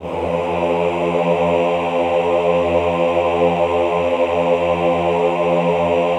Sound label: AHH F1 L, Classical Choir; Best Service; Extended Classical Choir; AHH LO+HI LL